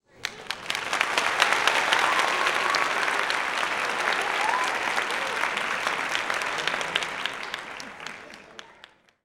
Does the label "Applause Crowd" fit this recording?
Yes